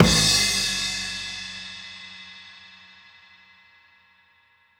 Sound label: Snare, Crash, Kick; Essential Percussion